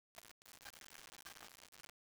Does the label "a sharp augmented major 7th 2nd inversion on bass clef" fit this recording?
No